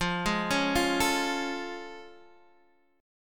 A Augmented Major 7th F

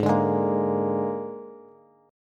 Ab Suspended 2nd Flat 5th Ab Bass; strummed; JGuitar

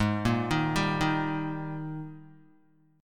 G sharp Major 7th Suspended 2nd, Guitar; arpeggiated; chord; 3,1,3,0,3,x

